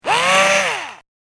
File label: Electric Screwdriver; Power Tool Sound Effects